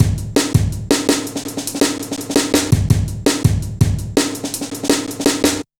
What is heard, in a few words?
Heavy Live A, Drum Loops, Jungle; Zero-G Jungle Warfare 1, 2 & 3